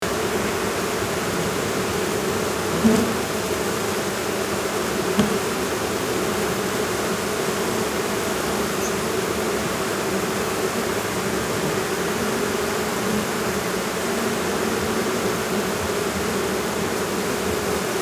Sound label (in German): Aufgebrachter Bienen Schwarm Flugloch